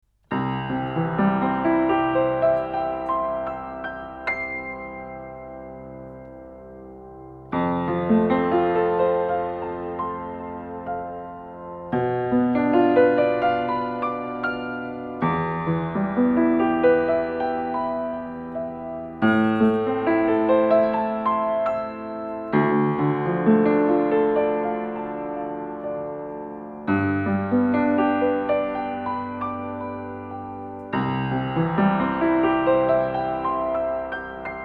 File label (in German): DPA 4060 Piano PZM Offen Deckel